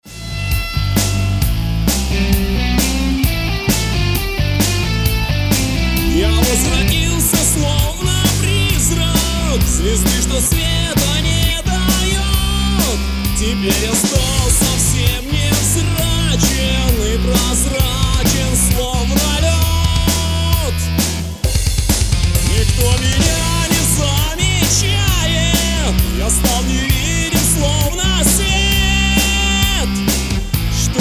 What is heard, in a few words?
Rock